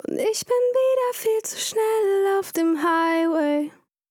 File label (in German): autotune gut; Pop Vocals abmischen